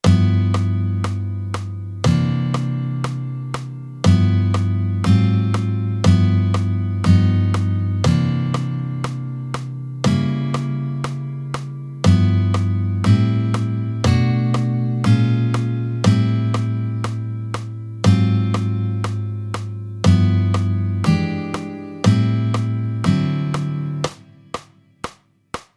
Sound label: Shell Voicing, jazz guitar; jazz guitar harmony shell voicing